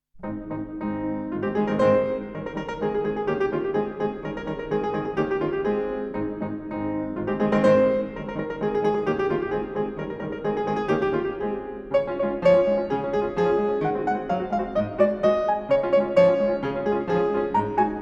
Galop Marquis, Piano; Chopin

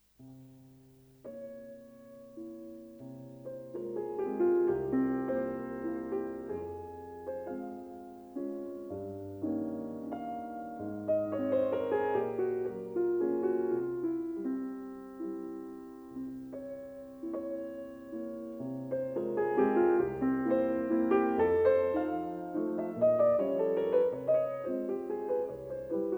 Mazurka in F minor op. 63 no. 2, Piano; Chopin

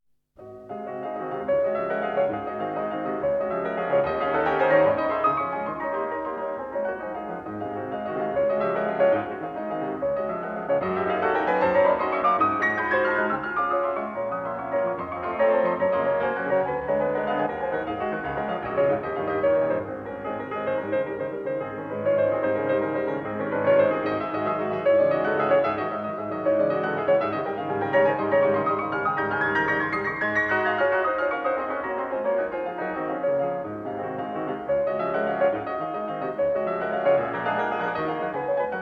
Etudes D flat major, piano

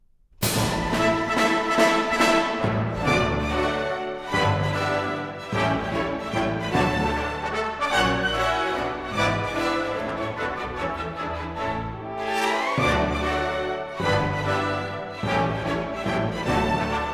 Mazurka

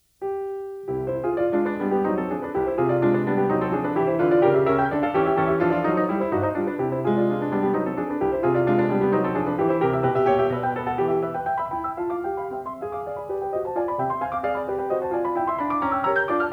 Etudes, No.7 in C major, Piano; Chopin; Claudio Arrau

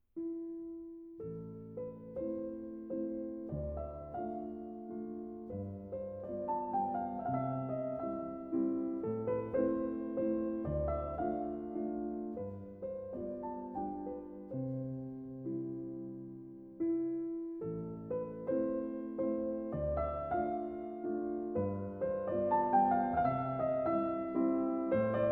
Waltz Nr. 11; Chopin - Solo - Valses

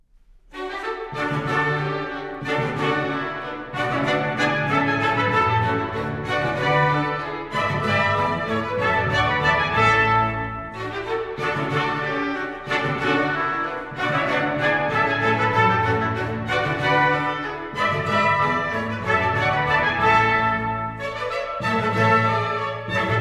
Orchestral Suite No. 3, Bourre; BWV 1068; Bach - Orchestral Works, Karl Munchinger

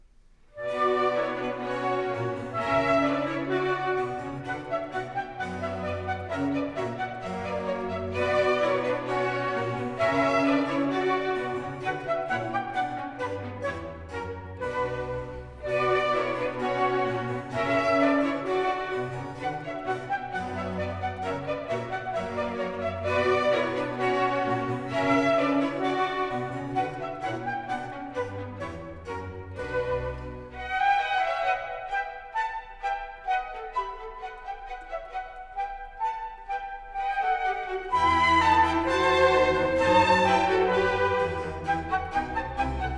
Orchestral Suite - II. Rondeau; No. 2 BWV 1067; Bach - Orchestral Works, Karl Munchinger

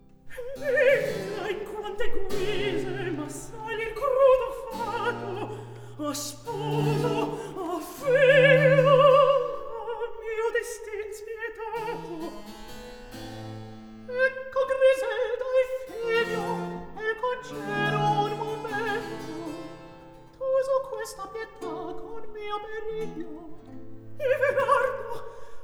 Recitativo; Atto I; (Griselda, Corrado, Ottone); Misera in quante guise; Antonio Vivaldi (1678 - 1741); Vivaldi - Griselda (2006)

